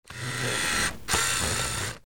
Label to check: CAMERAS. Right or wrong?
Right